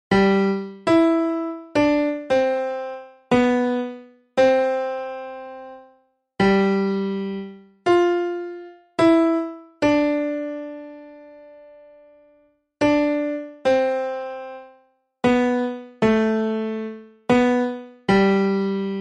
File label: piano